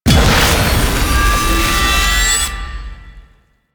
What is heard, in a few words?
Plunge Ability Ground Hit; Enemies; Abilities; Warframe; Duviri; Duviri Dax; Dax Knight